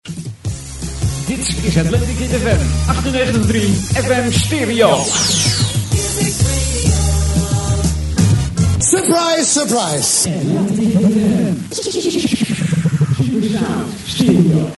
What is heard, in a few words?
Jingle supersound stereo; atlantic101